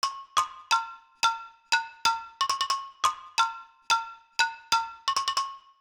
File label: ringtones; gaiety; OnePlus 6 Ringtones